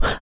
HIT, Jazz FX FEM PERC

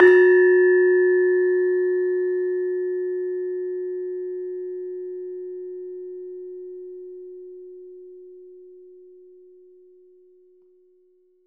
LAMEL F#3 -L; USB Soundscan vol.60; AKAI; LAMELOFO

